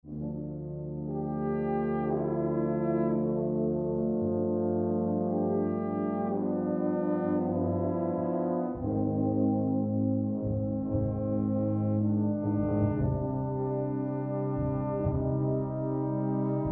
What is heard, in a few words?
Lake Brass; Pearl Harbor